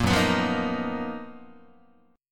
Gb Major 13th Gb down Guitar; GbM13 strummed; JGuitar

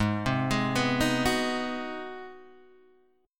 Ab 7th Sharp 9th Guitar Open G, chord

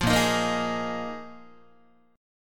A sharp 7th Suspended 4th, Guitar; A#7sus4; strummed; 8,6,6,8,7,7

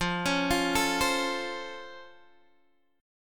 C sharp Augmented Major 7th F, guitar chord

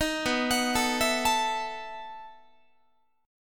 B 11th Guitar; arpeggiated; 18,19,21,19,20,20

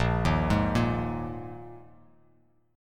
A sharp 7th Suspended 4th down; arpeggiated; JGuitar

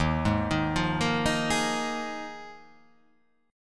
D sharp Suspended 2nd Suspended 4th D sharp

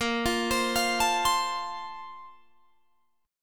Bb Major 7th Suspended 2nd, Guitar, Drop D

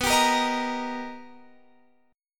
B Augmented Major 7th B Guitar Open F; B+M7; strummed; x,18,19,17,18,17; JGuitar

